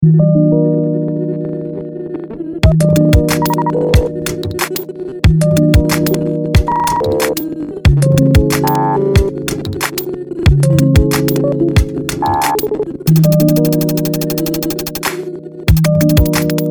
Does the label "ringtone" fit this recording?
Yes